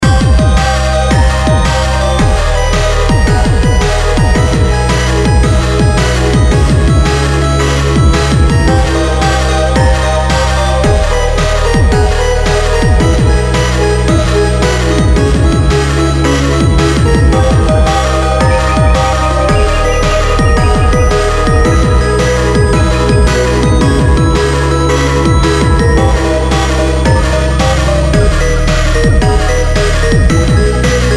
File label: chiptune police loop